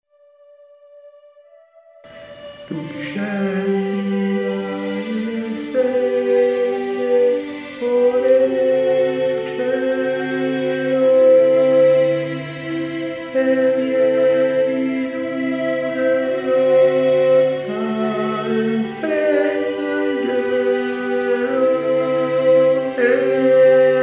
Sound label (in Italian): Tu scendi Ten; Tu scendi dalle stelle Tenori